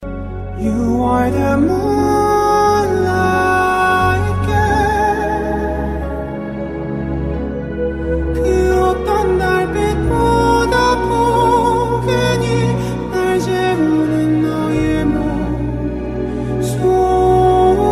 Lullaby

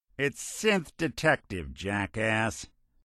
Synth; Detective

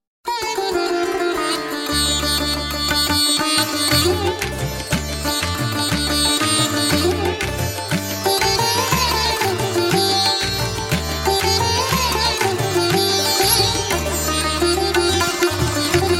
Afghan Sitar

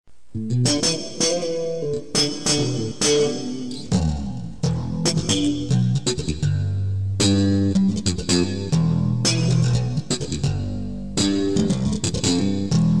Funky Bass

